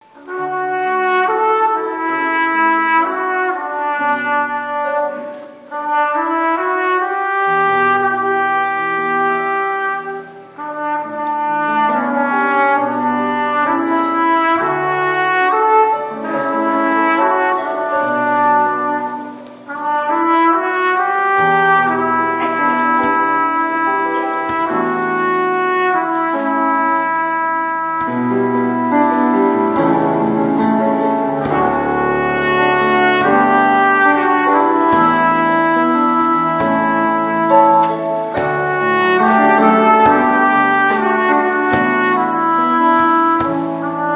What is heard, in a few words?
Trumpet Offering